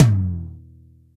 fresh ambient tom sample f sharp key, tom tuned to the F# note; tom drum samples